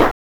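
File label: clap; E-Lab; X Static Goldmine 1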